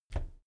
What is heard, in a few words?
bicycle brake handle back pull